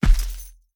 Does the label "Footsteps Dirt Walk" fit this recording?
Yes